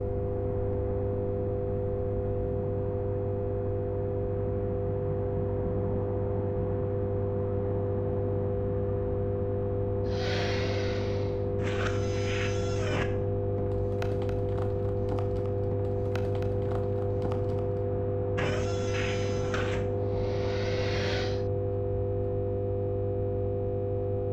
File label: downgrid charging platform, Ambience; sf2e; murder in metal city